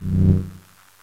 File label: Fade In